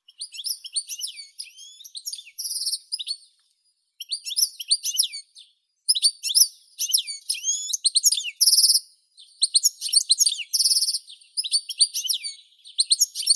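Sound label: goldfinch, Carduelis carduelis; zvuki prirodi; shegol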